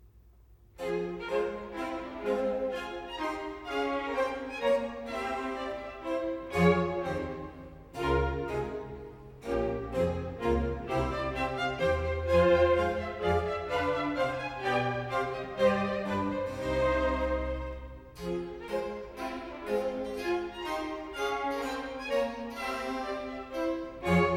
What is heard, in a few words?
Concerto Grosso in F major, Menuet; Handel Masterworks; Klassika